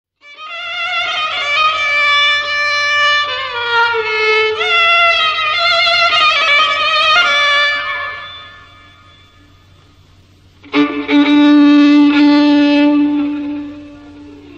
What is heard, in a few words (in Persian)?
ویولن